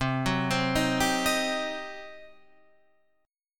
G Major 7th Suspended 4th Sharp 5th C

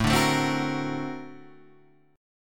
Bb Augmented Major 9th A

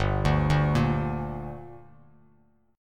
G Minor G, Bass Standard; arpeggiated; JGuitar

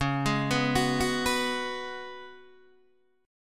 Db 7th Suspended 4th Db down Guitar DADGAD

